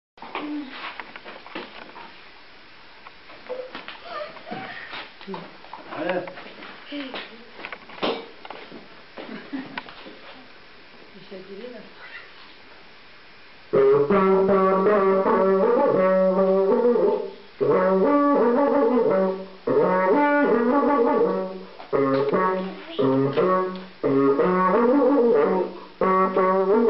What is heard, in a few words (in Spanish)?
Trompeta travesera